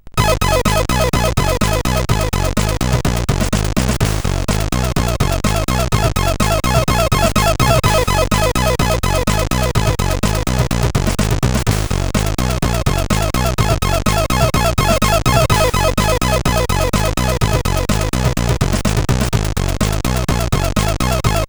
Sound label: Groovy sid CSG8580R5